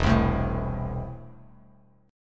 E Suspended 4th Sharp 5th, Bass Standard; strummed; JGuitar

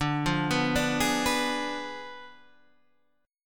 D Diminished 7th D down Guitar Standard; Ddim7 arpeggiated; JGuitar

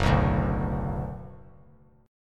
Gb Suspended 4th Sharp 5th, Bass Standard; strummed; JGuitar

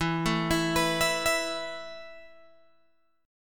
E Suspended 4th E, down, Guitar, Open G

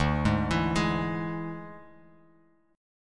D Suspended 2nd Flat 5th D Bass Standard; 10,11,14,13; arpeggiated

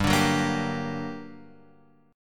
G Minor Major 7th, down, Guitar, Open F; GmM7 strummed; JGuitar

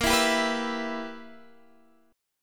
E-Minor-Bb; Em/Bb strummed; JGuitar

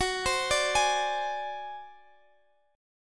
G sharp 7th Flat 5th F sharp

